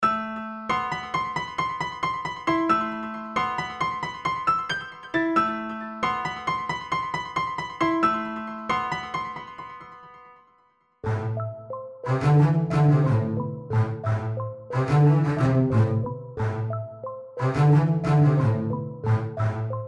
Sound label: BEATS